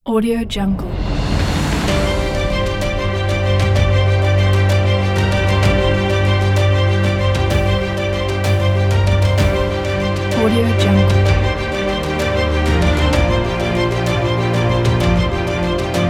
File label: epic adventure